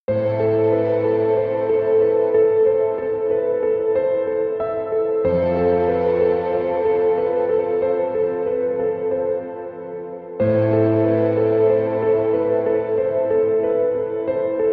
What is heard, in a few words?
No Harmonium Theme No Slide Guitar No Ambient Guitars